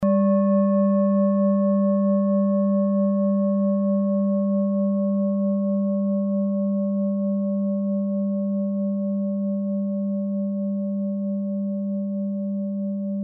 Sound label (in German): klangschale ladakh